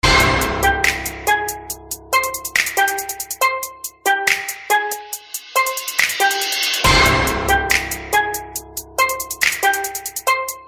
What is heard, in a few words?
new ring ringtone